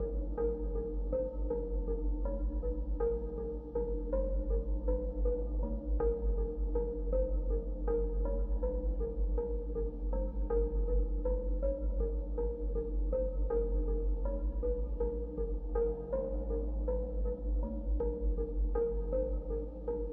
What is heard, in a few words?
CS80 Space Ambience; Landinar Into the Void